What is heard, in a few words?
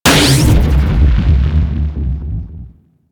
Fire, Weapons; Infested Tentacluster